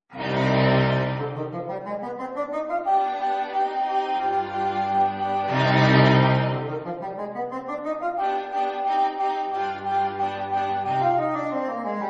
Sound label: Quartet